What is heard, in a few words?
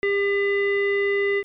ALARMS